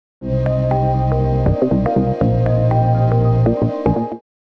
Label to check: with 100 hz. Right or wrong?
Right